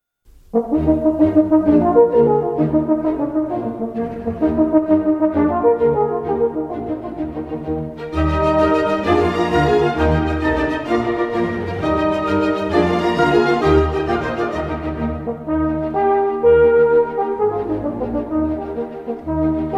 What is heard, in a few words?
concerto